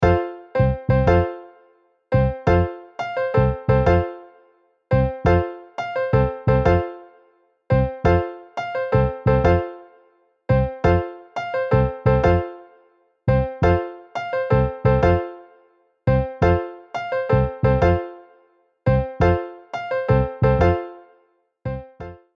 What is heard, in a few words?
Piano Bass MIDI